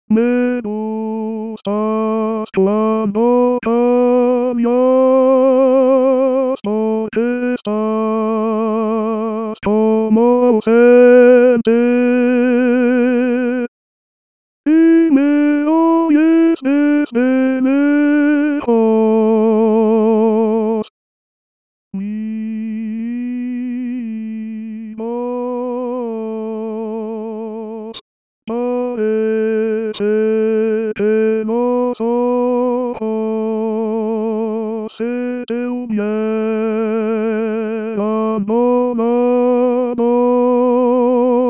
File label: Baryton; Neruda